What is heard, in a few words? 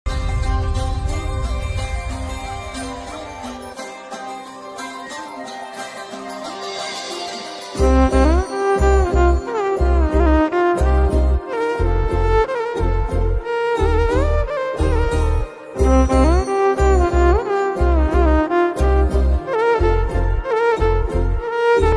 love bgm instrumental ringtone flute ringtone; sita ramam